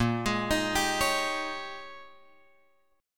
A sharp Minor 13th A sharp, Guitar chord; A#m13 Chord {6 X 6 8 8 9} arpeggiated

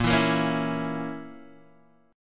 Bb Diminished Bb down Guitar Open G

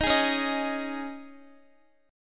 C sharp Suspended 2nd C sharp Guitar, chord; C#sus2; strummed; x,x,13,13,13,10; 4E,4A,5D,5G,5C,6F

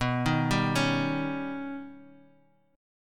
D sharp 7th B, guitar; D#7/B; arpeggiated

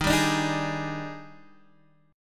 D sharp Major 11th, down, Guitar, Half Step Down

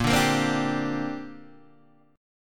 A sharp 6th Add 9th, Guitar DADGAD

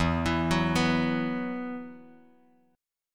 E Suspended 2nd E, down, Bass Standard; Esus2 arpeggiated

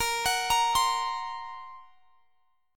A sharp Suspended 2nd Sharp 5th; A#sus2#5 arpeggiated; x,x,20,23,23,20

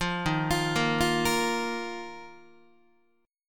D sharp Suspended 2nd D sharp, Guitar; arpeggiated; chord; JGuitar

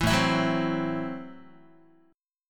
A Minor 6th A Guitar; Am6 strummed; 7,5,7,4,4,x; JGuitar

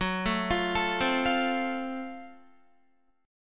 Gb Minor Major 7th Flat 5th Gb Guitar; GbmM7b5 arpeggiated; 14,12,15,14,12,12; 4E,4A,5D,5G,5C,6F

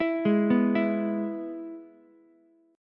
Ab Minor Sharp 5th, Ukulele; Abm#5 arpeggiated; JGuitar

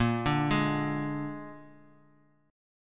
Bb 7th Bb down, chord; arpeggiated; JGuitar

